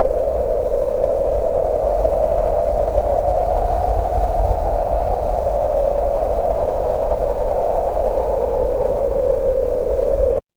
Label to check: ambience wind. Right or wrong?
Right